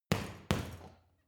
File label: boxing punching bag side hook double hard